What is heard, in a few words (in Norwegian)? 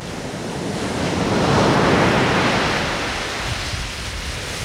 havbolge